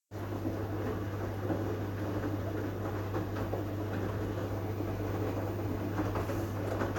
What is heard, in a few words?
Washing machine